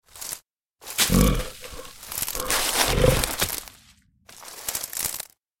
Bear movement and growls

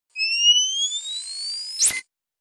Night vision goggles power switch on off; Military Equipment

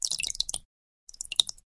Liquid hands in water tiny movements

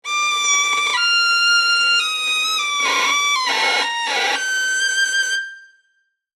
Violin awful play string scratch

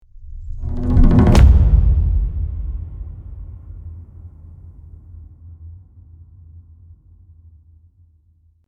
Tribal drum impact cinematic hit

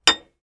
Ceramic plate setting down on a table

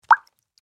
Water droplet plop; Liquid

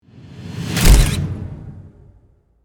Cinematic camera flash shot

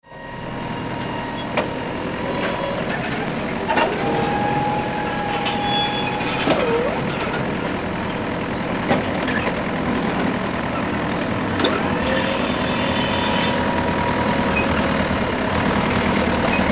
Forklift digging worksite operation

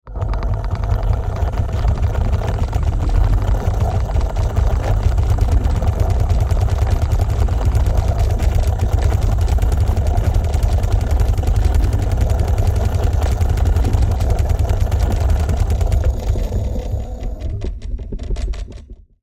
Rotating vintage mechanism sequence; steampunk; gfxsounds

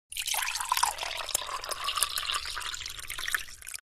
Pouring water from plastic bottle into glass

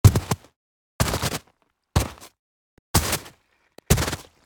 Footsteps on snow boots walking

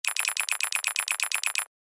Urgent fast beeps

